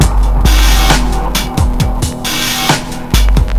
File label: Slomo 67 bpm, breaks; Zero-G Beneath the Planet of the Breaks